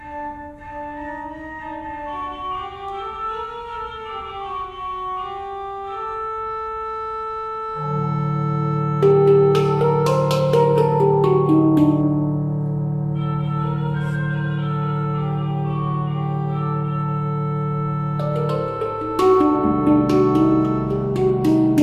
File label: ufo